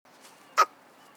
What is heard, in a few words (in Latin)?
Corvus corone; SETE